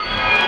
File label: NOISE RIDE, percussive; Rarefaction; Poke in The Ear With A Sharp Stick